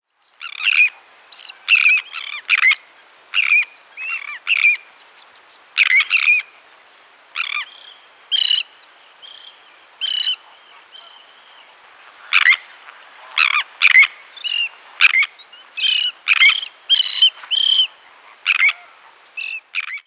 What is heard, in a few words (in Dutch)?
Grote stern